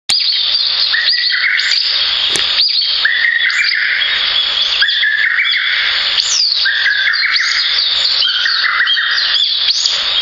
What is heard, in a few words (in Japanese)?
早朝のホトトギス